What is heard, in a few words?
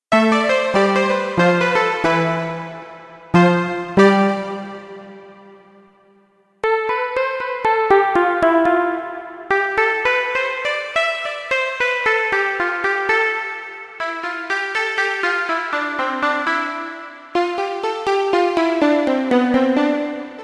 Guitanjo; Kawai K1; Bank 2 card A